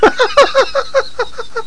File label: JACKPOT; Monster Media Number 15